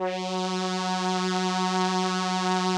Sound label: SOLINA, String Machines